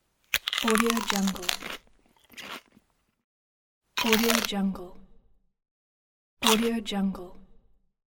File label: crunchy apple bite sounds